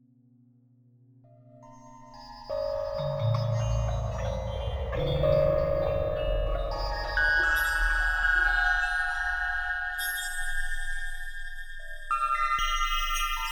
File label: granulator